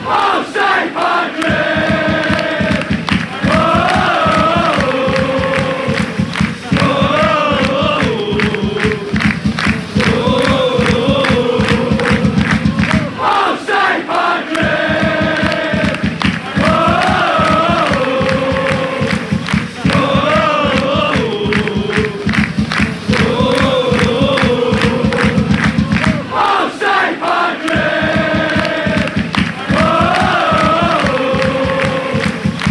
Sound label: oh st patrick oh